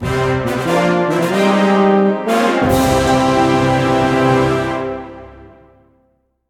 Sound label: Cleared Jingle; Peak